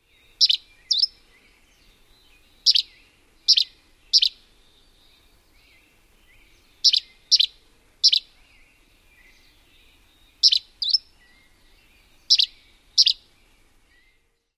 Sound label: song; CA